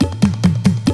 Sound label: TABLAS; AMG - Now CD-ROM (Roland)